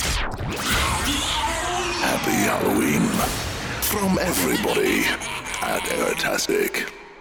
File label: Happy Halloween Stab, Jingles; Airtastic Radio ROI